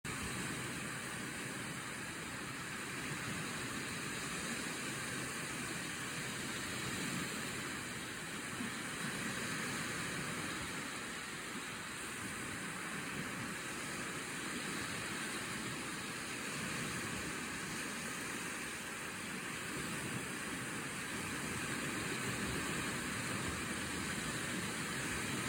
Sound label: ocean